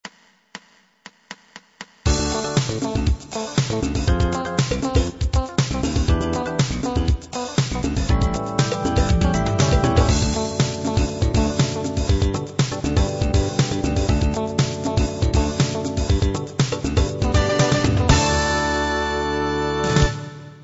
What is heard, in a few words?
expanded 16beat